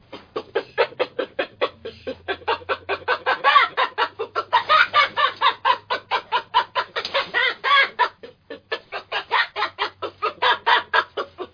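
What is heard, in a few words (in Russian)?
babuin, baboon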